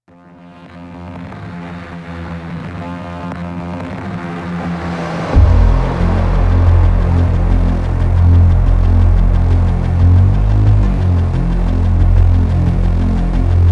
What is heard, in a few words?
Main Ambient; Mars Core - Phobos Main Ambient 06